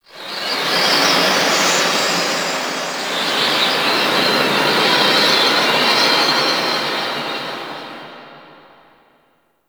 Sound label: space wind; Space Wind B; Ambience; Warframe; Orokin Moon